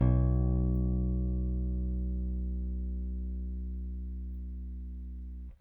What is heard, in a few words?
RickThumb-B, RickenbackerBass; Airwindows